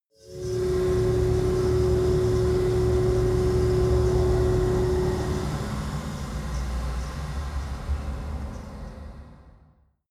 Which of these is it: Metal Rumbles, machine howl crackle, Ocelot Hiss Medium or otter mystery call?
machine howl crackle